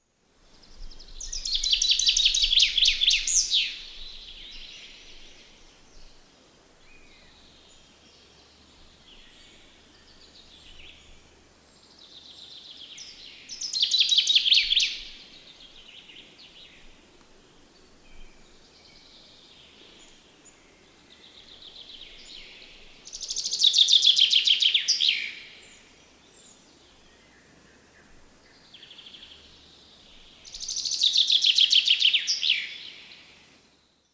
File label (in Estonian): metsvint; spring